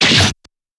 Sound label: Kung Fu Fight Sounds